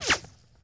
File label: coil; quake